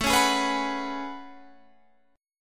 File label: A Major 13th, down Guitar Standard; AM13; strummed; capo=2